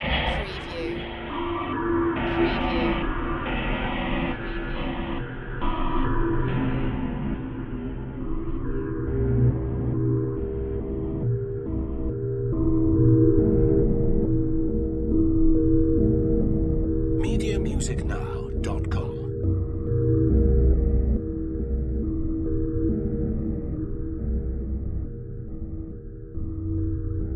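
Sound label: Production Element Sweeper; Sound Effects Bible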